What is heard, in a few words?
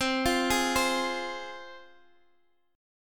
C Suspended 4th Sharp 5th C Guitar Half Step Down